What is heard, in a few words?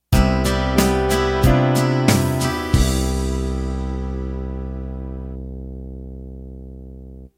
Country Ballad End